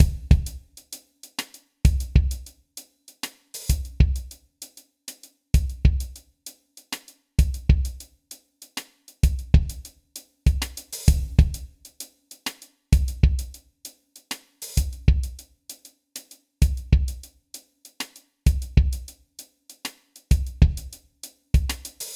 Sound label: Cemetery Loop Drum; BouncyBoi in Puzzle Land